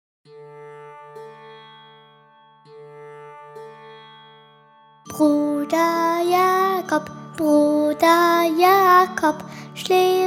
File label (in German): Gesang